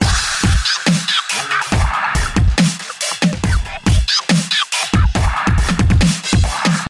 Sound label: musical drum loop electronic 140bpm